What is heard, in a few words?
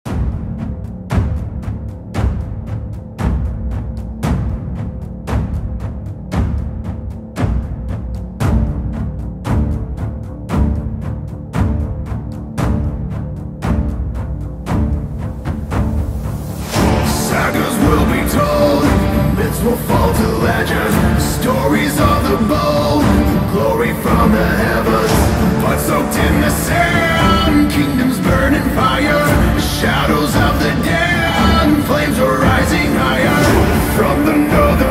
viking nordic song